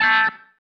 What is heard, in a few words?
Stab, Organ, One Shots